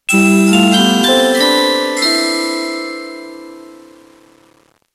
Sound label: 25.6k 8PCM music